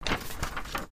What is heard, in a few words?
paper take